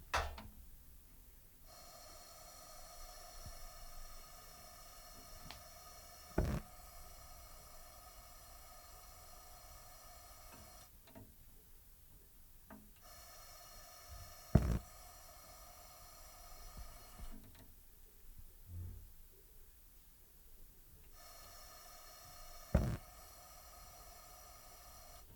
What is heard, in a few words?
Stove Top Gas